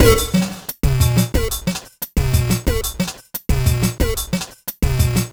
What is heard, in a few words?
bootboy 090bpm, Downbeat Dance Grooves; Zero-G